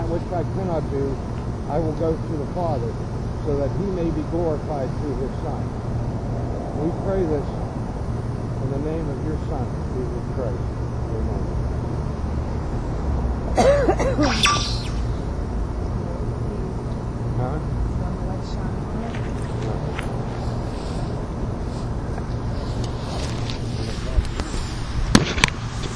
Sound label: electronic noise